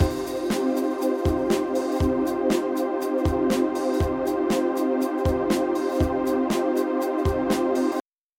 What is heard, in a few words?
Mono beat and bass; Phasis